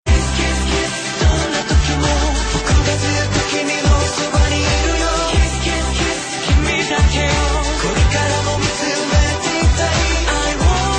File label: Kiss